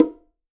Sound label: Bongo Low Open Soft; One Shots; Drums; Ableton Live 12 Lite; Core Library